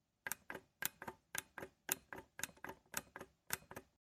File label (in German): Seifenspender